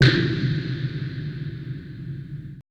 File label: AMBI FX